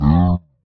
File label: cow hurt; mobs; mobs mc